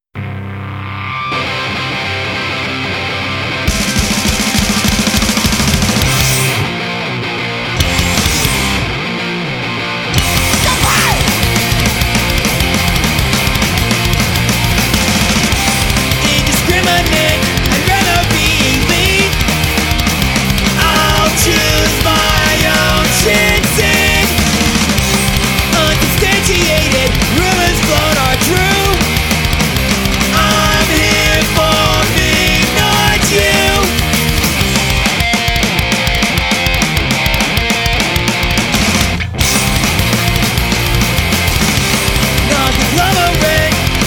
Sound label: punk rock